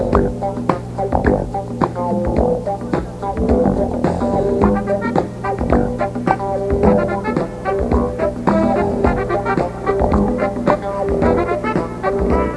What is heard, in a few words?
get funky